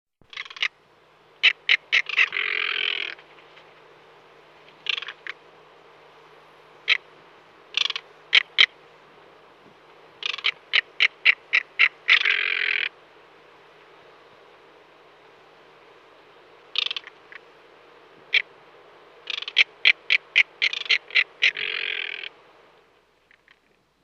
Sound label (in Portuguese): caracara; fauna